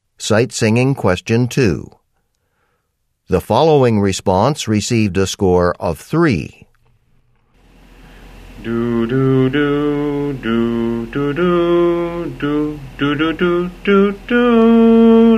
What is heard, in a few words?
music sample